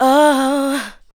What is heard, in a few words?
ADLIBS 90; Ueberschall; Jam Box